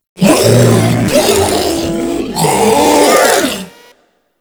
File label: Tentacle Swing Scream, Proto Infested Ancient; Warframe; Abilities